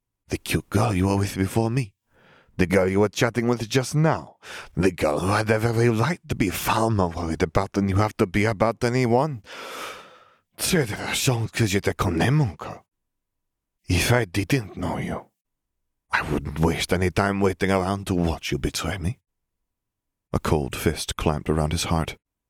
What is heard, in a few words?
no deep breath